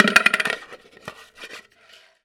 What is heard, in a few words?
Thunder Drum